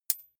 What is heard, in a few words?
midsize metal thin hit chink; industrie-wirtschaft; baugewerbe